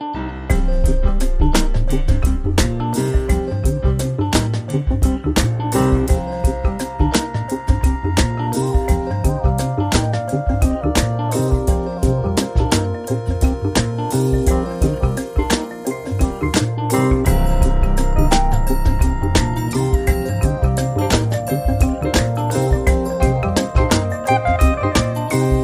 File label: Afro Smooth